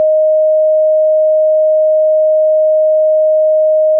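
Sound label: Clean Sine DX100 D#0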